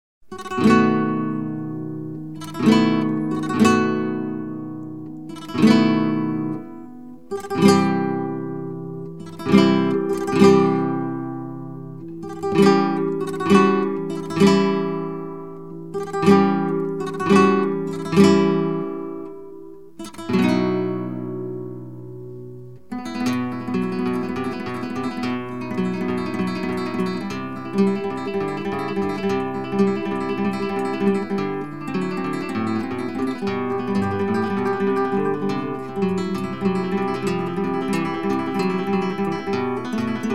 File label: Granaina